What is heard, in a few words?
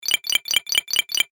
Game App Recharge; video game; Video Game Sound Effects